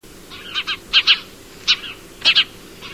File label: Passer hispaniolensis (song)